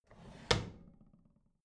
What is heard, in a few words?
bathroom drawer close regular